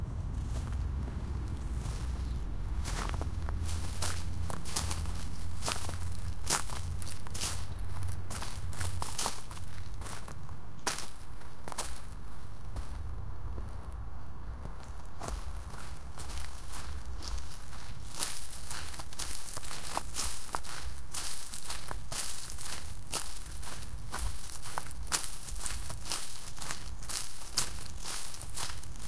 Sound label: walking on the grass step